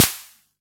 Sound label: SD B; Olson XX-100